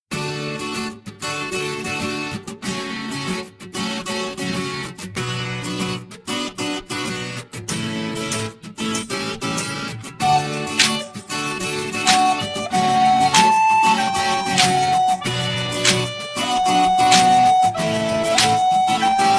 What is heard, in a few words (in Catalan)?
Ràpida amb flabiol